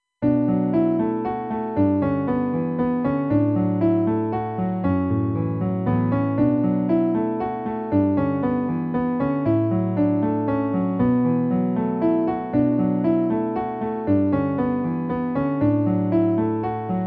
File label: piano; lostcolors